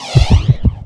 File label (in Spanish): corazon; Grounch